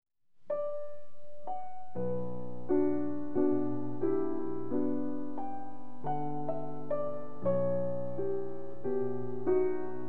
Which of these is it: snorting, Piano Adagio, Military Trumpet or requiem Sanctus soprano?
Piano Adagio